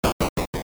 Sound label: stairs